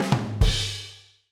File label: baduntss; element-call